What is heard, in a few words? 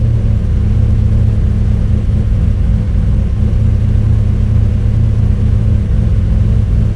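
interior idle, Vehicle; NASCAR Thunder 2004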